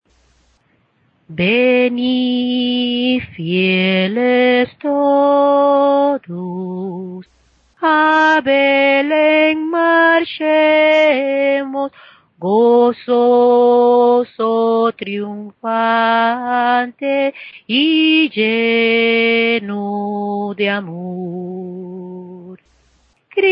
O Come All Ye Faithful (Spanish)

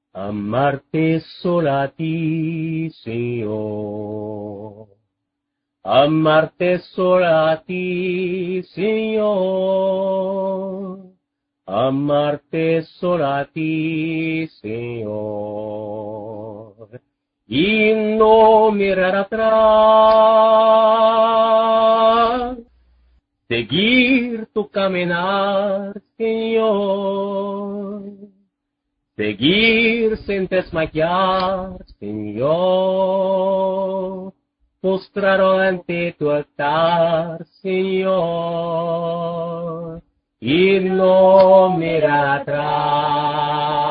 Walking With The Lord (Spanish)

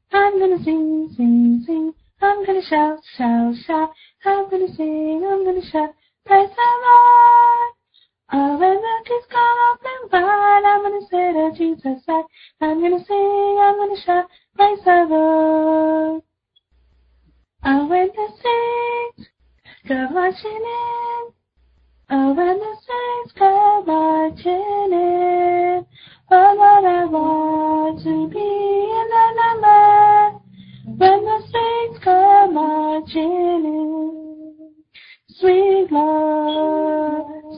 Medley Of Gospel Songs

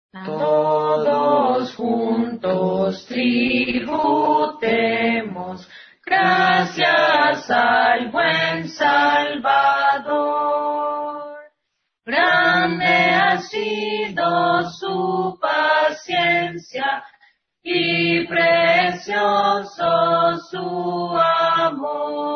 Alleluya (Spanish)

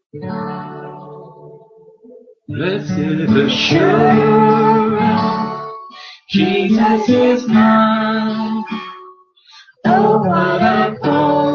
Blessed Assurrance (Duet Variation)

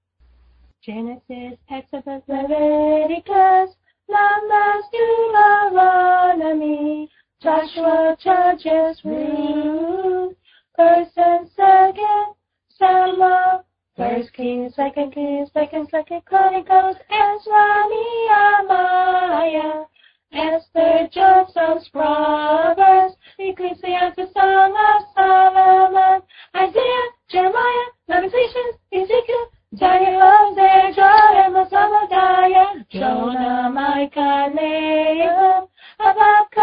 Books Of Old Testament Song

